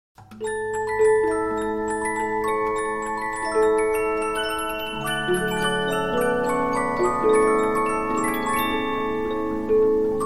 Polyphon, musicbox 15inch

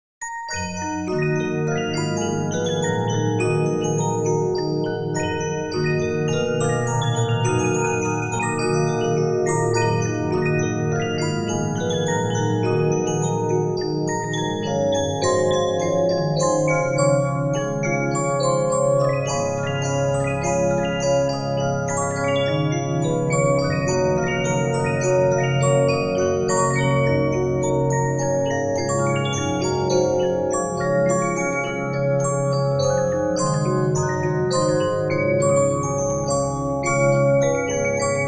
Regina; 15inch; disc musicbox

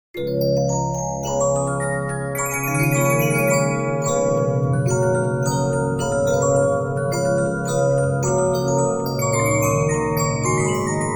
Regina, March, musicbox; disc musicbox; Giggler

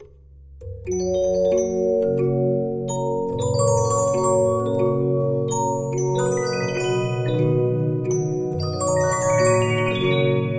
Regina, 15inch disc musicbox

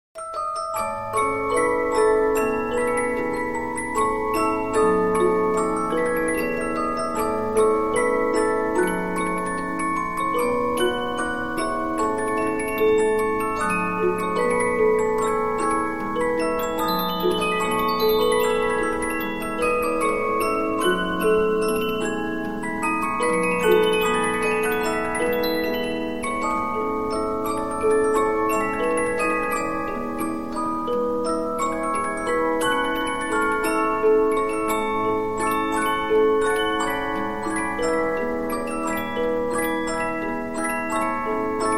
Polyphon, 15inch disc musicbox

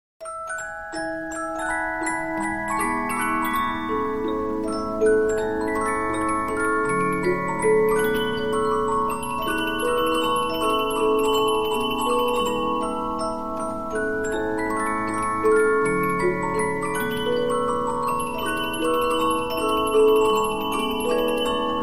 Polyphon; musicbox 15inch

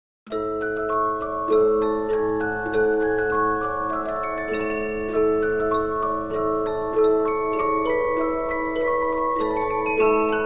Polyphon, musicbox; 15inch; Carmen

